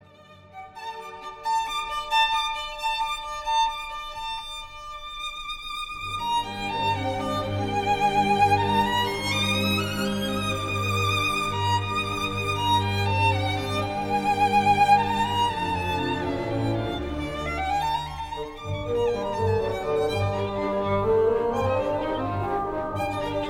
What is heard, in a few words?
Beethoven Violin; Berlin; Karajan; beethoven violin mvt 3